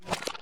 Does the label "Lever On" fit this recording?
Yes